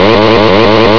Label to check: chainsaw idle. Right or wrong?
Right